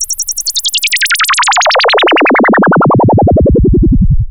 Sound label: ARP2600, MODULAR SYN; Vintage; Sonic Reality; Vintage Timetraveler